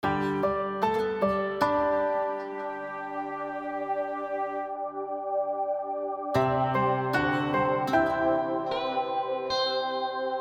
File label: piano recreation